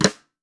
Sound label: Steel Trap Flam, Snares; Ghosthack - Free Snares 2023